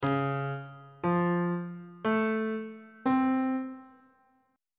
a sharp harmonic minor 7th chord 3 is c sharp augmented major 7th